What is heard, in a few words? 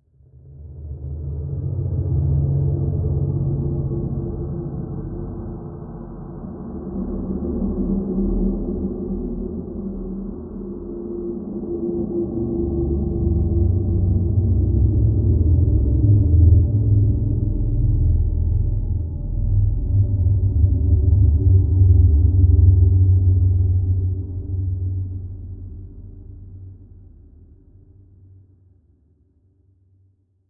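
horror abandoned hospital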